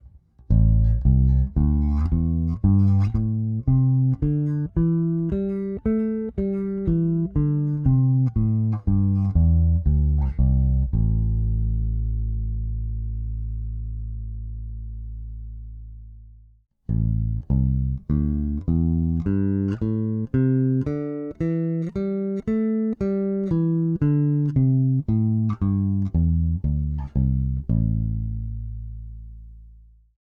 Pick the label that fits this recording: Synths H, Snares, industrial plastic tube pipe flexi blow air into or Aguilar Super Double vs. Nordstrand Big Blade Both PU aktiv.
Aguilar Super Double vs. Nordstrand Big Blade Both PU aktiv